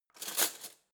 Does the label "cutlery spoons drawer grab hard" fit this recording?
Yes